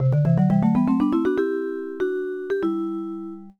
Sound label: Chime; little mermaid the ariel s undersea adventure nintendo ds